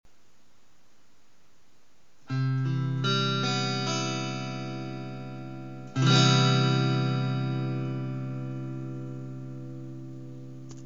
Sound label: c-maj7, guitar